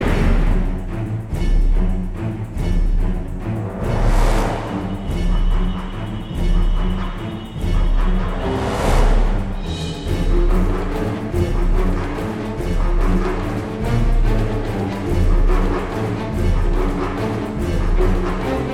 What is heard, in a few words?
Radio Station Combat